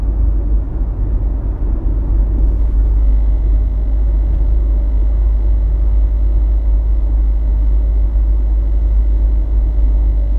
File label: noise